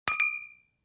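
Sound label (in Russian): стучат по бутылке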